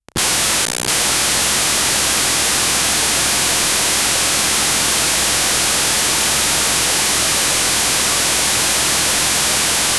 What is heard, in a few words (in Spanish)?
ruido blanco y cortocircu, electricity